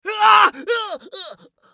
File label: scav death; eft